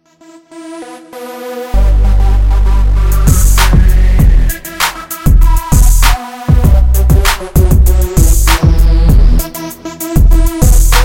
Hip Hop Beat

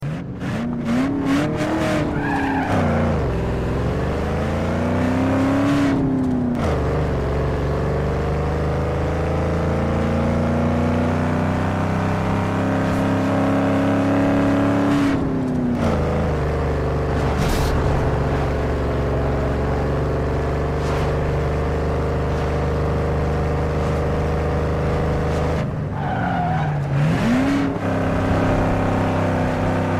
Hummer; NFSU2

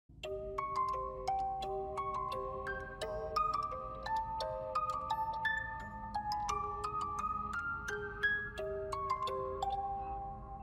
music box

